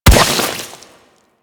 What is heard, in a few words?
Chem Strike Nox Rifle Projectile Explode